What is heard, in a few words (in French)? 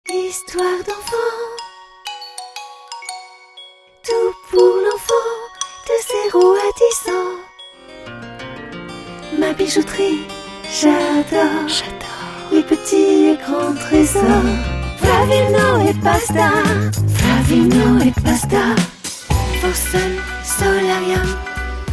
choriste